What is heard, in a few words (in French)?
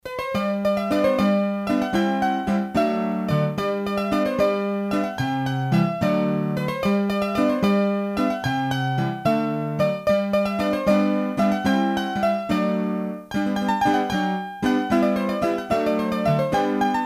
Bourree, hurdy gurdy; Bourrees a 3 Temp